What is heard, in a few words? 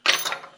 metal clink; 2fast2curious; fieldgoal